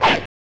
Swing Sword; Dragon's Lair; SNES

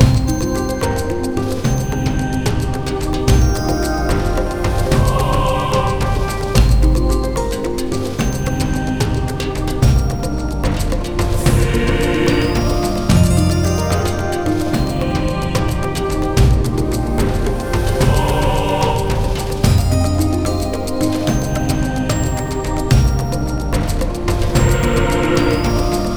Garden Idle LOOP

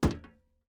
metal land

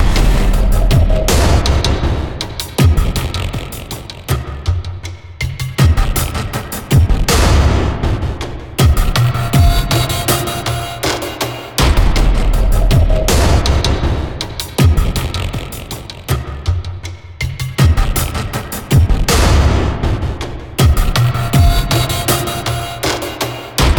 Victory Loop; It Came From Space and Ate Our Brains

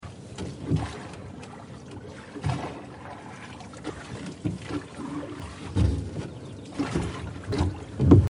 Rowing Boat; hiberworld; android

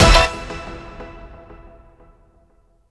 Stages Stinger; Anarchy Splatcast

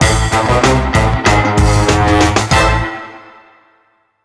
STAB

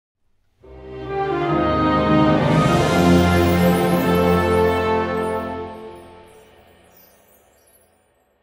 Fanfare; lego worlds

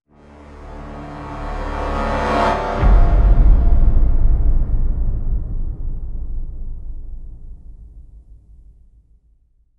Short Music Cut

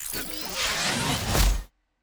Reward

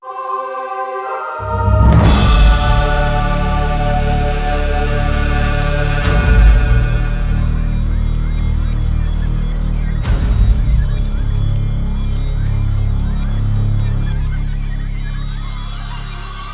Round Start; Revelations

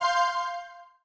Card Deck Check

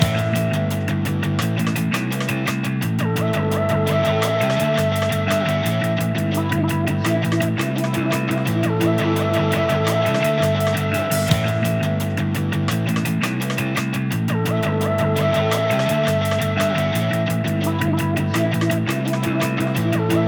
Jam Session; Splattack

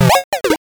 Coin; 4 D Warriors

